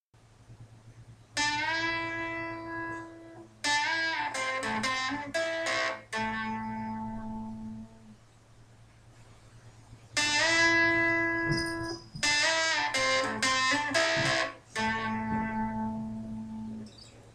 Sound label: blues licks for guitar